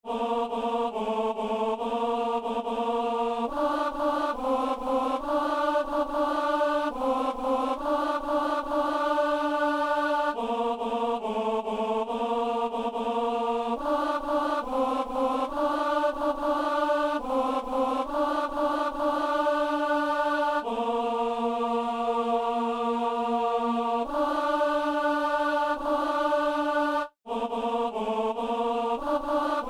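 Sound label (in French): ténor; messe de san lorenzo; sanctus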